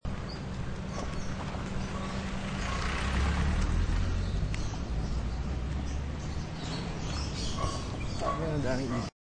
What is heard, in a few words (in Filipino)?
kotse ibon